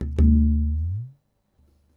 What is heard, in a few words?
lower hit, tabla; Dirt-Samples